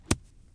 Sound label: golf flub; ProStroke Golf World Tour 2007